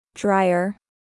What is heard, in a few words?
dryer